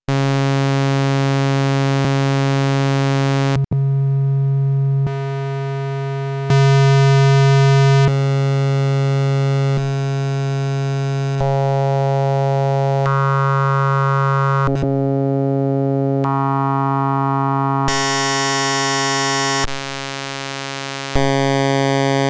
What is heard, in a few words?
Studio Electronics Quadnic Waveforms Bank